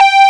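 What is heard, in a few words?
square; sound canvas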